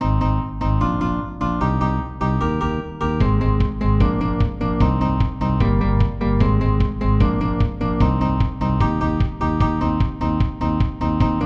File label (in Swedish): fullt tempo